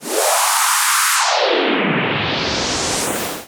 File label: Air FX, Filtered Noise; noise hiss crackle